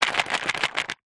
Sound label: household jewelry earings new on card several shake